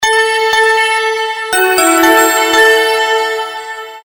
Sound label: February ringtone